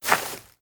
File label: Grass Walk